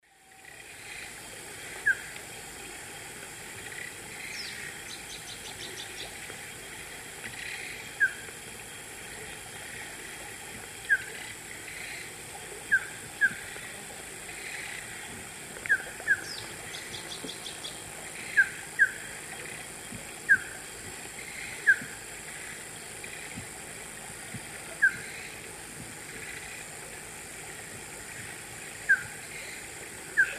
Icterus croconotus, song; S. America; Eastern Lowlands

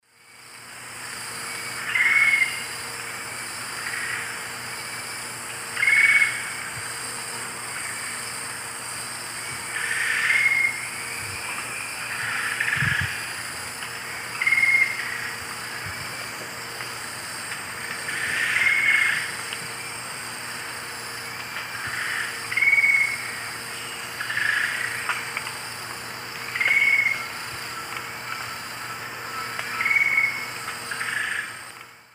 Hemitriccus minimus, song; S. America; Eastern Lowlands

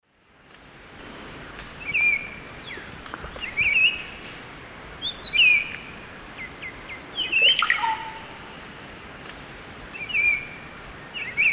Saltator maximus, song; S. America; Eastern Lowlands

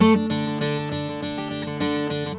Guitar D, Acoustic

